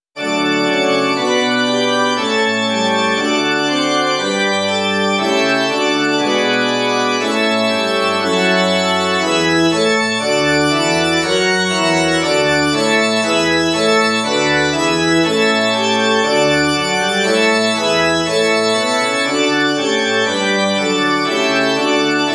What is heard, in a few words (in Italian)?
inno organo; Bergese Giuseppe